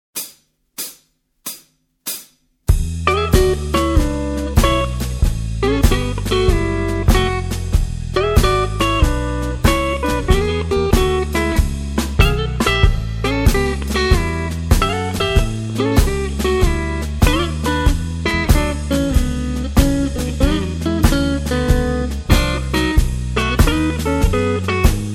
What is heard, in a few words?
blues jazz